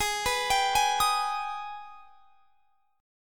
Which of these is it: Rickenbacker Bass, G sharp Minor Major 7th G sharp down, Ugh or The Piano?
G sharp Minor Major 7th G sharp down